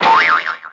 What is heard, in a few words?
boing